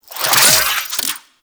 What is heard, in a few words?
Necramech Repair Drone Claw Spin; Enemies; Warframe